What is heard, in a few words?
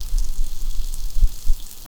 dry tap on concrete pavem